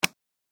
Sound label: Cards Card Placed; Card Sound Effects; casino sound effects